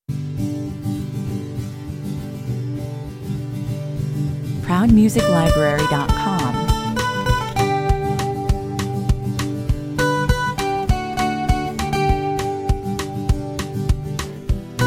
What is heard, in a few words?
Plucked guitar with a country beat dreaming of the big waves at the end of time, 100 bpm